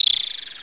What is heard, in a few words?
ant die; Army Men TiS